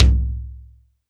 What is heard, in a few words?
Roland R-8 Taiko